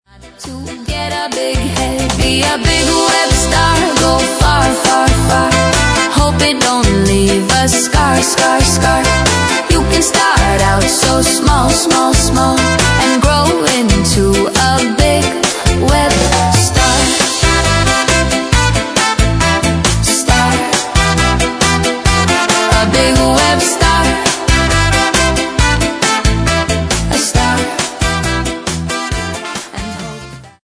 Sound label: House Reggae